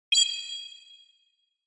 Cyber

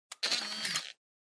Camera Compact Turn Off

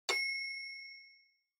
Metronome High Bell Single

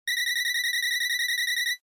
NES Racing Tire Squeal

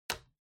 Bingo Machine Master Board Ball Put

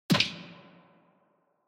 Motion Grab Reverb

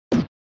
SNES Fighting Defend

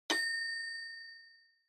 Metronome Low Bell Single

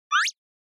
Motion Pop

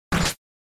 Attack, NES RPG

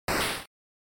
Noise Phrase, NES; NES-General

